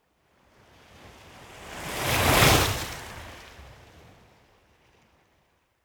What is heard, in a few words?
Ocean Surf Water Lap On The Coast Surf Medium Faster Waves; Hollywood Edge; sound designer's toolkit v2